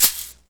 DM5 Perc, Alesis DM5 drum machine

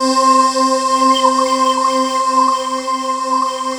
deep forest C4

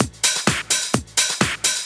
Clubhouse Loop 128 BPM

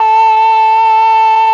OBOE

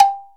TUNED COW, wood; Spectrasonics; Heart of Africa 1; AF.WOOD

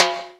TIMBALE, Hip Hop Misc Perc; E-MU Producer Series Vol. 8 – Platinum Phatt

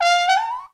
TROM DOIKS

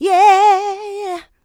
Vocals, Adlibs; Houseworx

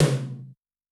Tom, Yamaha DTXpress II

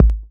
BD; Best Service; Dance Mega Drums

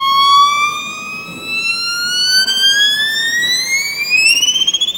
VIO MISC, MISC VIOLINS

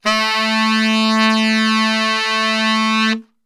A 1 SAX STR

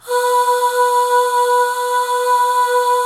B3 FEM AHS, FEMALE AHS; Northstar - New Gold VOL-1

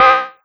SYN, 160 BPM, Drum & Bass; Ueberschall; Drum & Bass Flash Trackz

